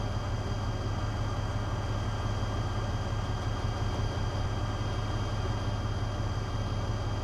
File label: vehicle tank idle